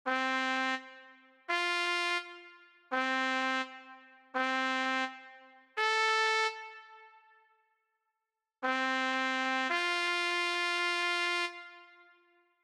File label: Trumpet; 吹奏楽; R6 吹奏楽部 卒部式